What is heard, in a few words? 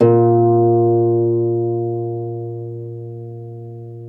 Troubador Harp